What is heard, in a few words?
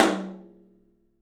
OPEN TOM; 3D Sound; 3D Drums